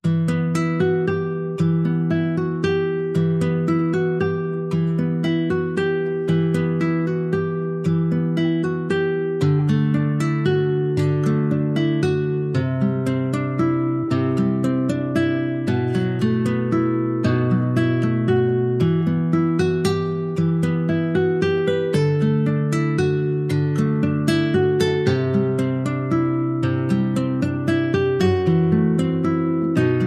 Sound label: What Child Is This, Guitar